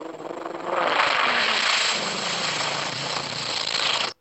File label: Copter Flyby; Dom the Copter